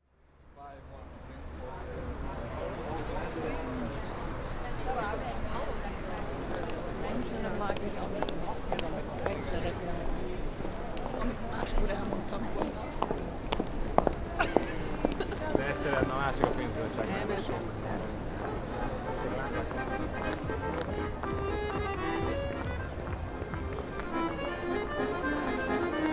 Strolling Along Váci Street

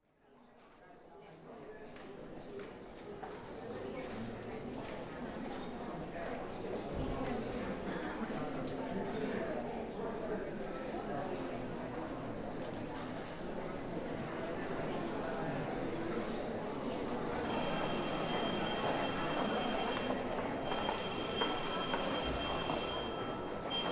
A Performance Beginning In The Pesti Theatre

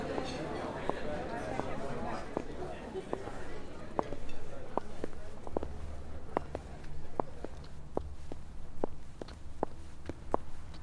Strolling Through The Street Late At Night

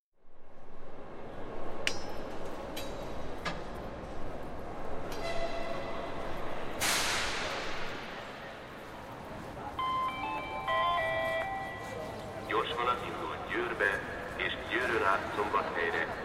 Train Departing From Keleti Station

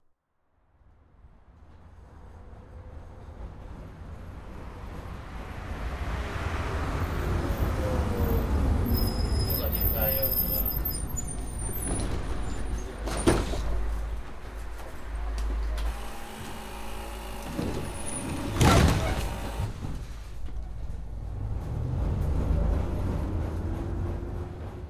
First Tram Of The Day At Nyugati Railway Station

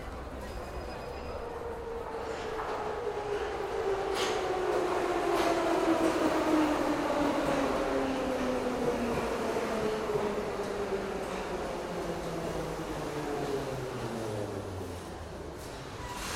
Taking The Metro For The Ecseri Flea Market

